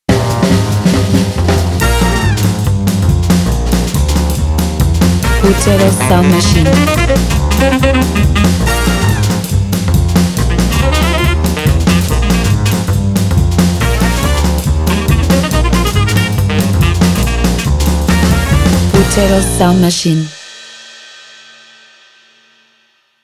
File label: crazy and funny sax